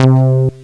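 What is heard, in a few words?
clavinet; Descent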